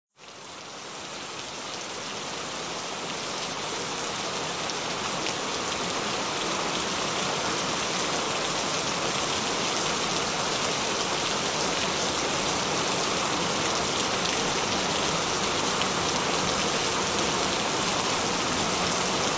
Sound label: Frequencies, Gentle Rain